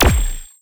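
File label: funnel, impact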